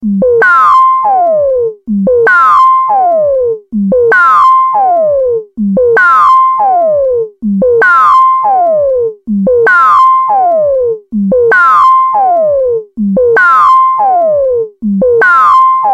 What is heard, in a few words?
Sci Fi; Iphone